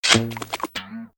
jump

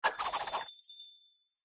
withdrawl; Poodletooth-iLand; Panda3D

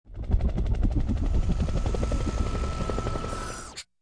propeller in; Toontown Online

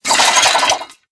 headshake; toontown just works

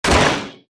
Hitting Wood Fence

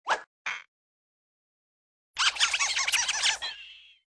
heal tickle; Toontown Online

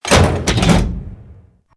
switch pressed

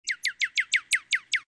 bird

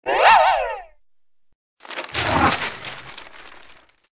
pink slip; Toontown Online

